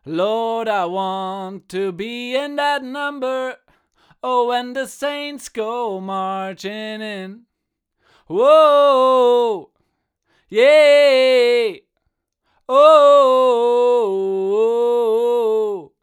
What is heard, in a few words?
Vocals 30cm; Austrian Audio OC18